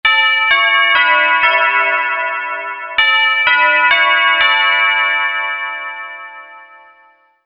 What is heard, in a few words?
Big Ben